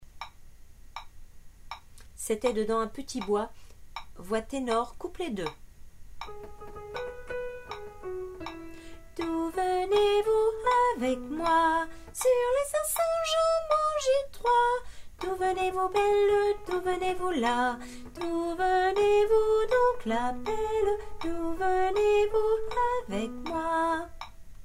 tenor

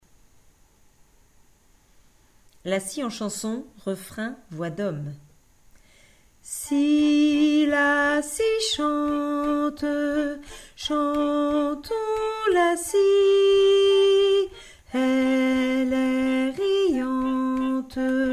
refrain hommes; la scie en chansons

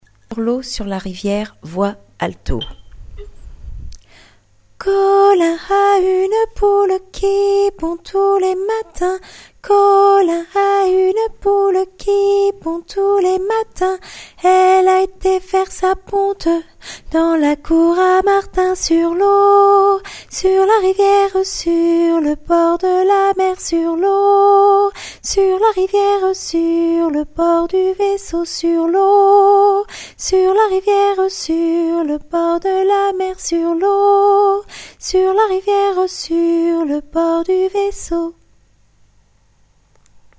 alto; sur l'eau sur la rivière

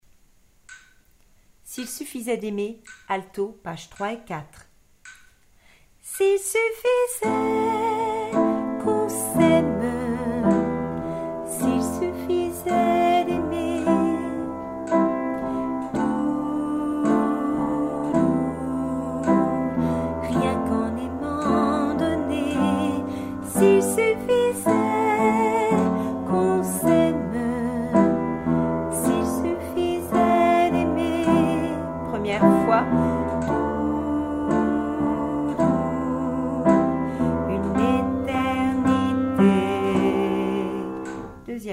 alto

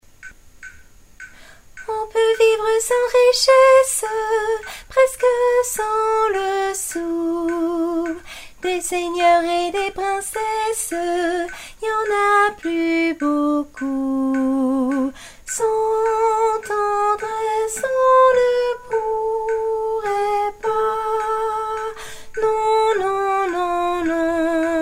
debut sopranes

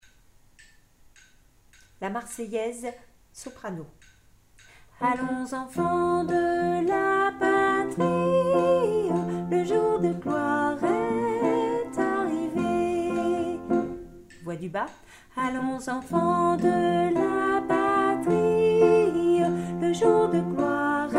la marseillaise soprano

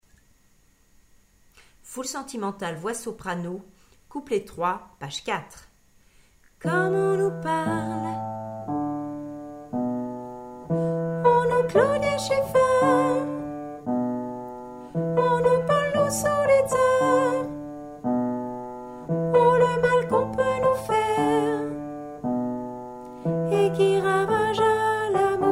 soprano

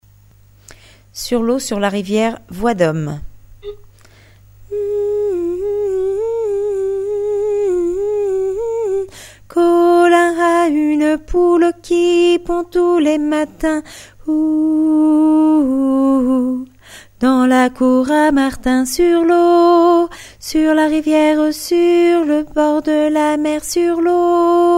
hommes

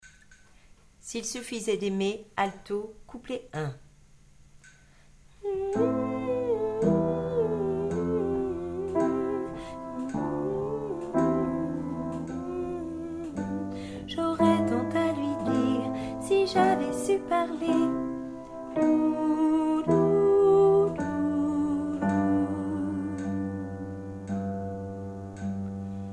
alto

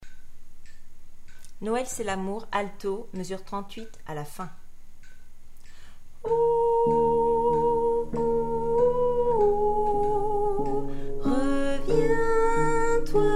alto

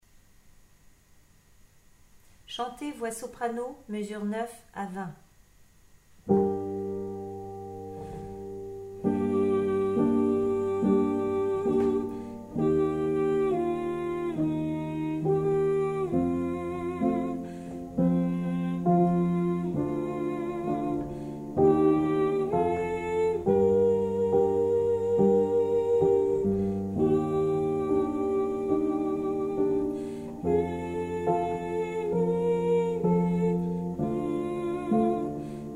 chanter soprano

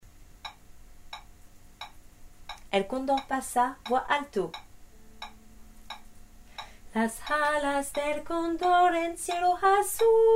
el condor pasa alto

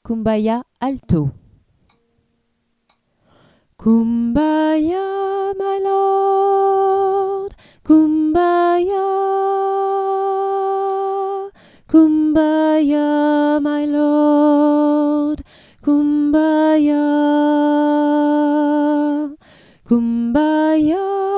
alto

